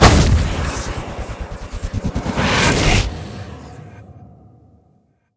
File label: conjure portal; conjuration